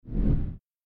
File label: move